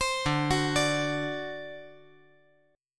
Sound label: D 7th, down